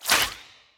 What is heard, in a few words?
butter drop